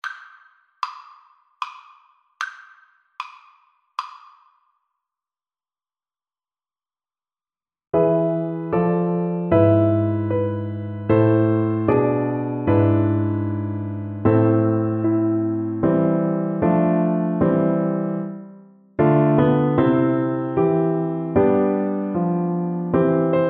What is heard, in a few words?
sonata, Cello; Marcello, Benedetto - Sonata No. 5 - Mvt 3 - Largo